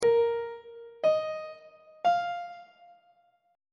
b flat suspended 4th triad chord intervals on treble clef